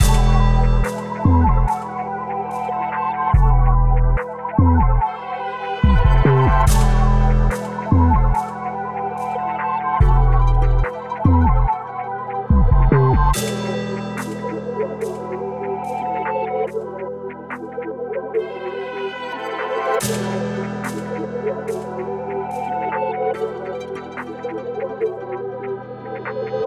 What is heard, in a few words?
144 BPM E Min (Drill), Melody; Cymatics; PARADOX II; Melody Collection